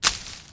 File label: bush; Mafie 1